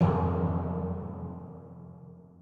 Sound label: timpani